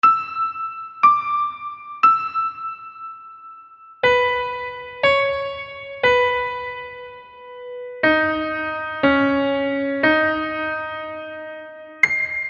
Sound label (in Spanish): Ejercicios de Intervalos de segunda mayor ascendentes y descendentes